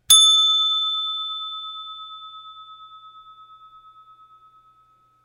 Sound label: deskbell